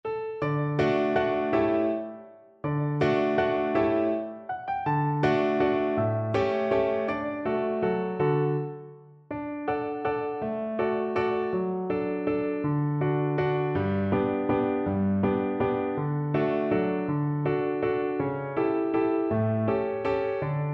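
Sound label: viola karaoke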